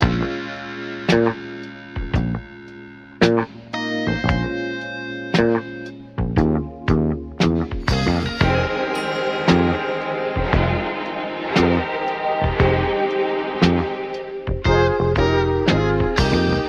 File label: guitar; Phonky